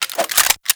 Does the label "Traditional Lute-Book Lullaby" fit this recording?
No